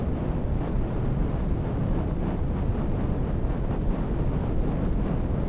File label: spell fire loop; player